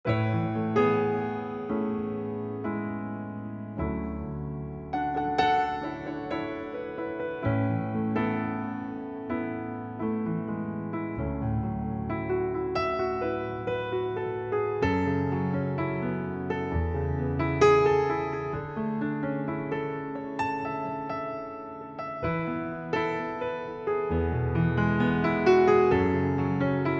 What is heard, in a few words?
Piano